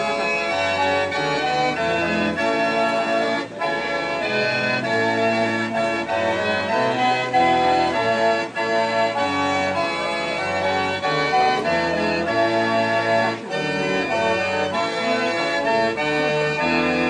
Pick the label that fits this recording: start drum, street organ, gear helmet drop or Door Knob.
street organ